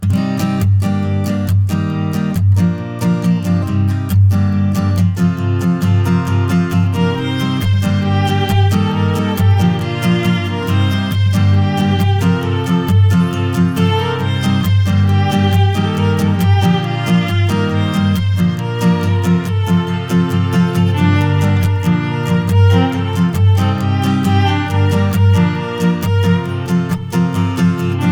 Positive Step (no drums)

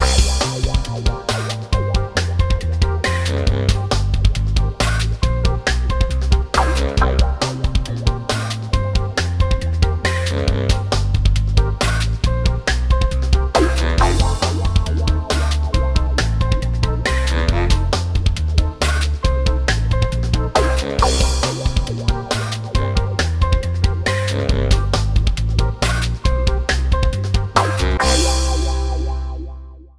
no melody

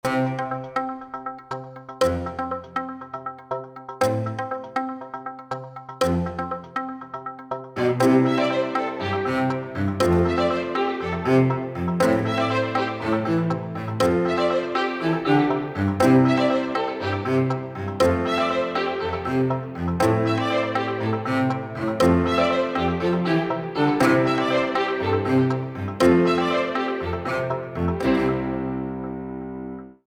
Etude In Energy (no drums), string theory

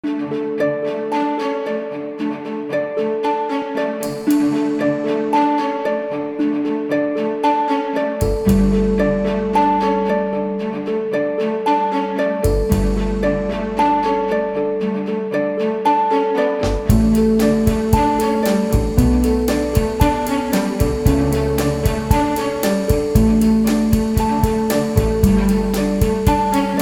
strong strings

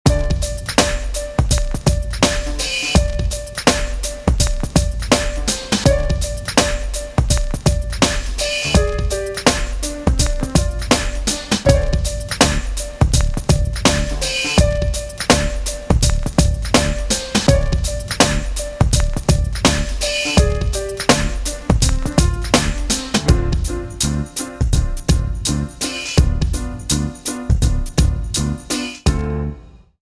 Funky Day

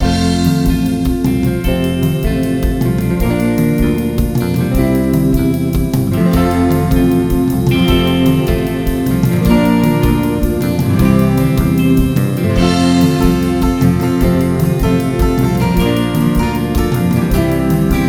(add ac. gtr); telecaster

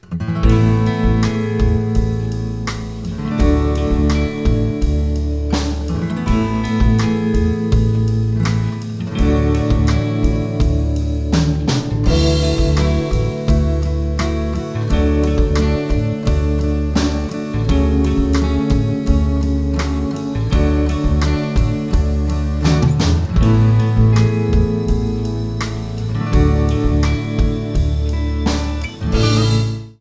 Tentative Feelings, add ac. gtr

